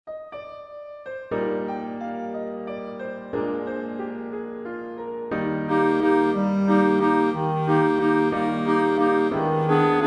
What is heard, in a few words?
Chorus Excerpt Tutti; A Nice Dilemma; Trial by Jury